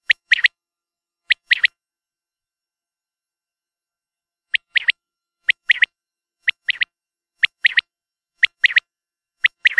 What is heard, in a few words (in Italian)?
QUAGLI